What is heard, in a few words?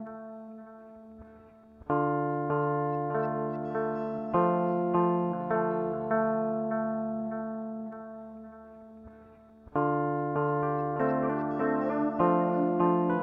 Keeley Electronics ECCOS Loop